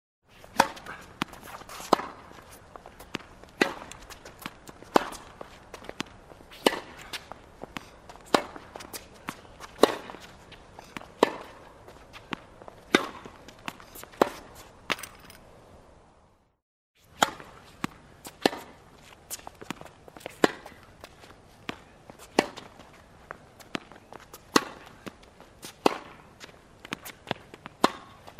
tennis

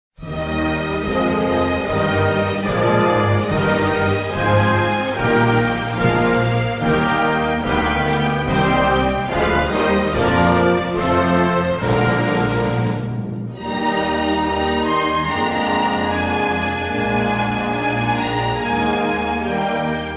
anthem

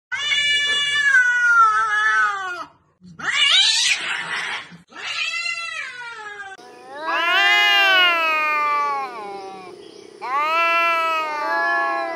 cat

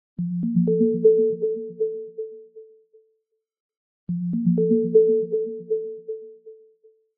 twinkle below; Стандартные рингтоны для звонка и будильника, звуки уведомлений смартфона HMD

